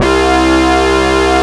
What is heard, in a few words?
ROLAND JX3P, SYNC, C2; Big Fish Audio - First Call Vintage Keyboards